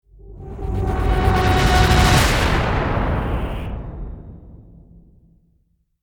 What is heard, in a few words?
Dive Bomb Hit Swell; Ninja Tracks